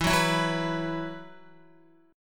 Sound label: E Suspended 2nd, Guitar; x,7,6,9,6,7; 4F,4A,5C,5G,5C,6E; strummed; JGuitar